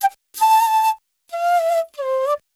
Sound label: ETHNO EXTRA 90; ethno2